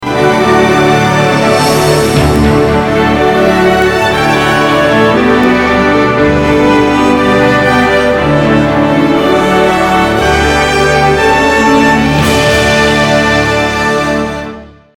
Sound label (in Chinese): 电视包装音乐